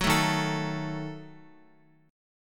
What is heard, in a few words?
Eb Diminished Eb down Guitar, Ebdim chord; Ebdim strummed; JGuitar